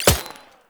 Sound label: Kinetic Plating Shield Hit; Warframe; Runner